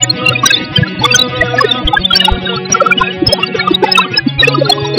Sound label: semi-blind estim guitar; WASPAA11